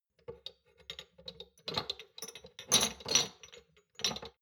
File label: shot glasses rattle very soft, gastronomie; berufe; soundpool